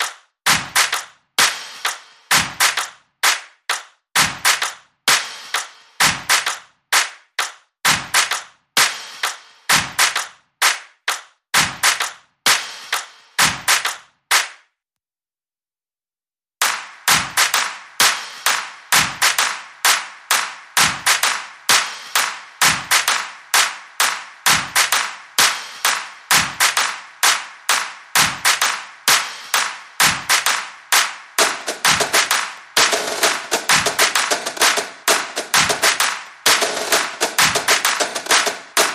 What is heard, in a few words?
Percussion